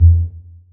slot reel stop

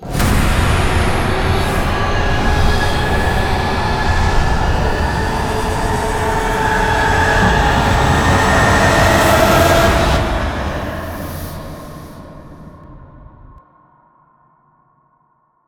near Win; netent

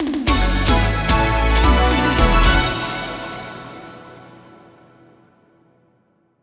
win large; netent

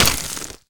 Hit Heavy Ice; netent

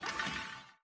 spin start; netent

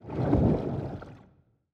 spin end; netent

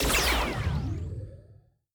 Win Symbol Lo pitch; netent

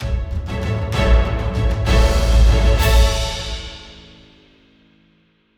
Outro Start; OceansGold; netent

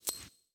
sym whoosh to reel

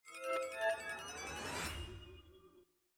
near Win; netent

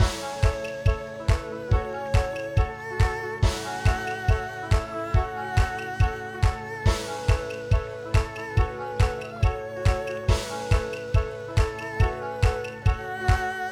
jackpot Loop

